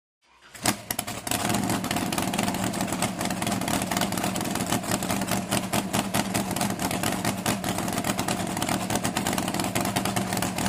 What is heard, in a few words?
Drag Boat Start idle